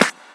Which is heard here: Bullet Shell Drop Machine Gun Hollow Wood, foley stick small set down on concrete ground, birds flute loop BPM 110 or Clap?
Clap